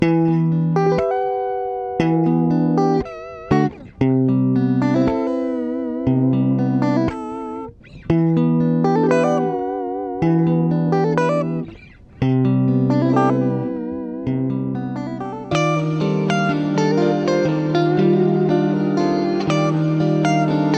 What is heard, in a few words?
Lofiazz Guitar; lofi jazz guitar; MSXII